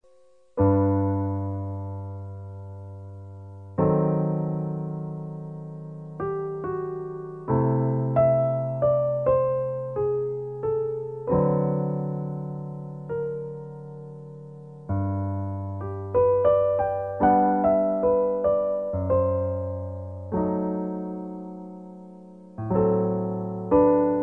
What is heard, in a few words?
melody